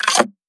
PERC mouth; M8; percussion and foley

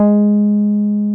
303 H, TB-303; Greytsounds VOL-1

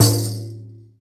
Tamorra, Roland BK-5 Percussion

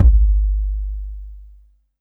BD hip, Dance Mega Drums; Best Service

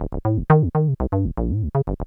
TB-120; X-Static Goldmine 1

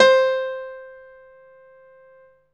PIANO, Dance Mega Synth; Best Service

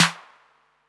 amiga clap snare; Polyend; Legowelt

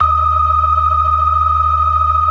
BLOOD OCT, Synth and Organ

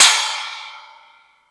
Thundadome, E-MU Pro-Cussion

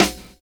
SD, snare, 70 s Breakbeats

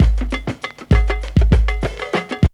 break; Zero G - Man Machine

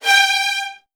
G3, VN 16TH, Virtuoso Strings; ILIO; Kirk Hunter

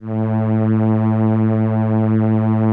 SOLINA, String Machines

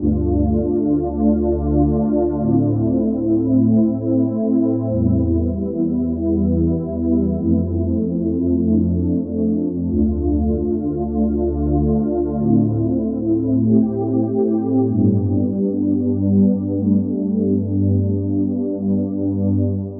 Ocean Pad; Dr. Fink's Funk Factory

